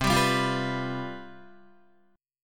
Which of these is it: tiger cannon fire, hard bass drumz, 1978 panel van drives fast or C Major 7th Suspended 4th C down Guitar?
C Major 7th Suspended 4th C down Guitar